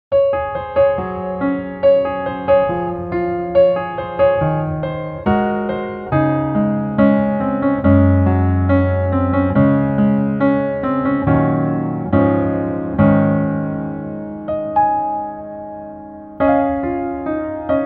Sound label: Piano